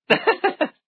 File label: haha; goku